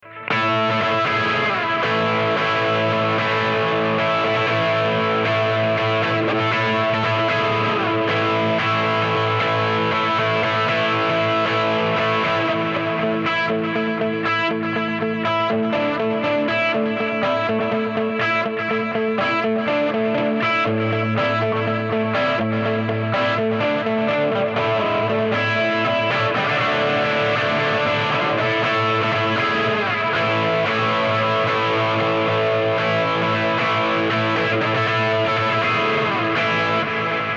delay

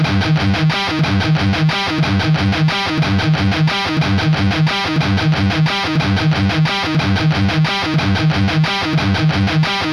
Maxon OD808 Soldano SLO100; Warren Haynes Mod

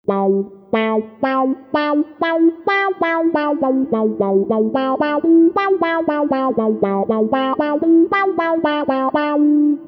rubber wah

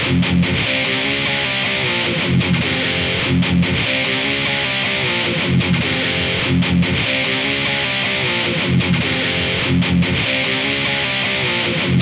Triaxis

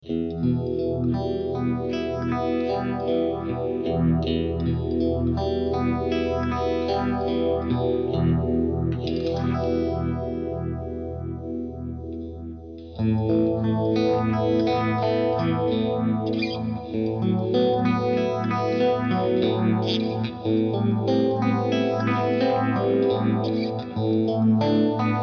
phaser compare

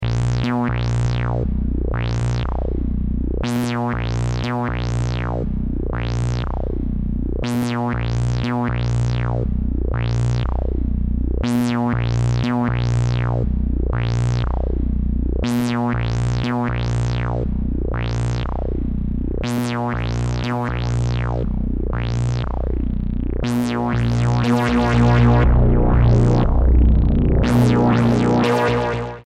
synth delay

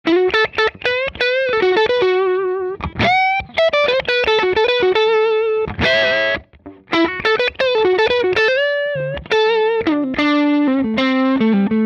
AC30HW2 Normal, amp

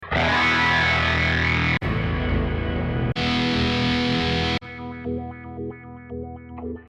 patch lag

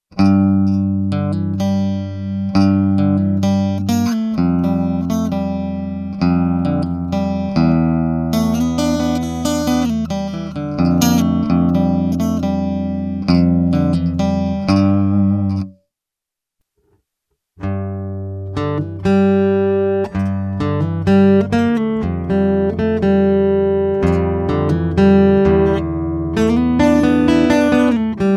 acoustic test

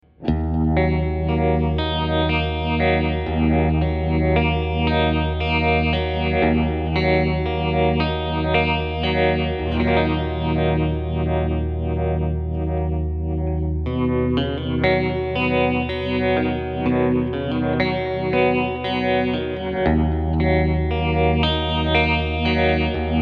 dual filter trem

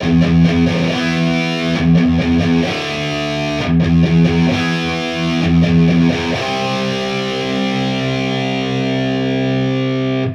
triple D, amp samples

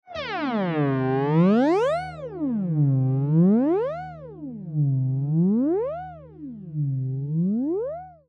sweep flange

